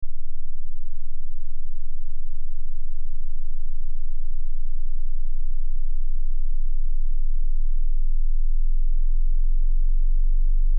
LFE Test Tone Osc, Lfe Test Tone Oscillator Log Sweep 10Hz-120Hz; 30 Sec -12Db Log Sweep; Blastwave